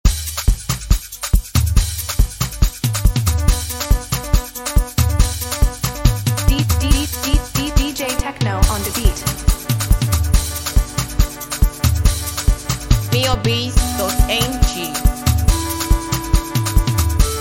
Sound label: Beat